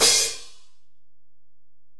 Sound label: Ride, Alesis DM-PRO, Drum Machines